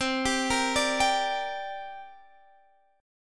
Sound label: C 7th Suspended 2nd, Guitar, Open G